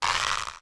Slingshot Pull

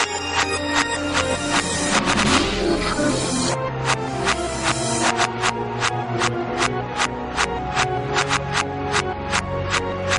Jumpstyle Remix

